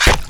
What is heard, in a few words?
attack; Riki